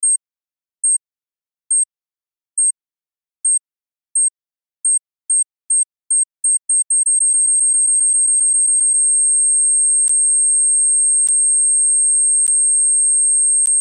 Bipe Toque

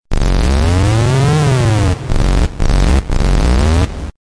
Efeito Sonoro

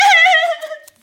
Risada de ladrao

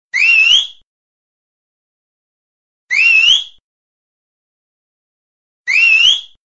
Assobio

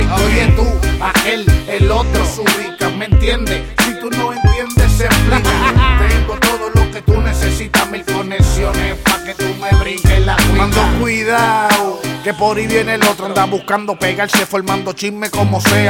Brinca Cuica

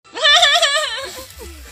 Risada de ladrao

